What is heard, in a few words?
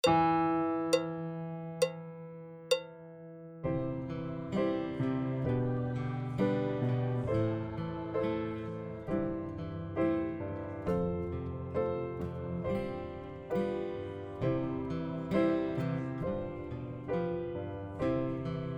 Backing; Section C